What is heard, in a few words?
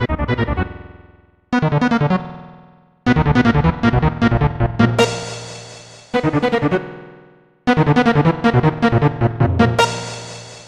Music